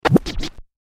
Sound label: Record Dj Scratch Spin; Dj Sound Effects; Blastwave